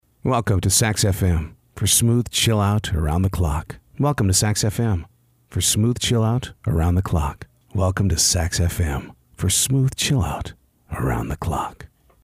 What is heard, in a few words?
sax fm, radio branding